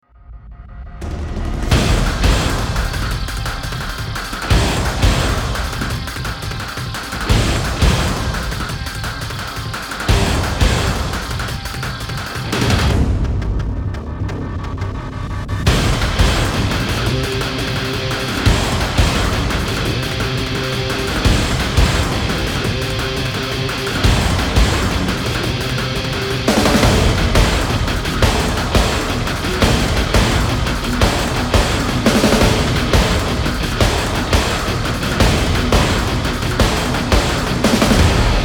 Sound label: guitar